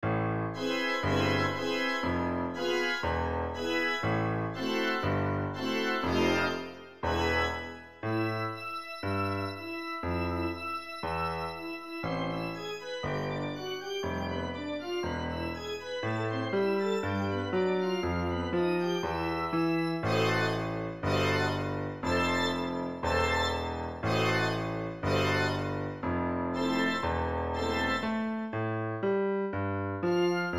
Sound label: suspence church organ